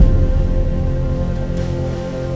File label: keys, Loops, Sad